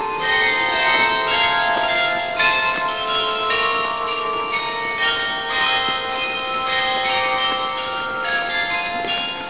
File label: Lisieux, carillons